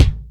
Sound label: drum hit whistle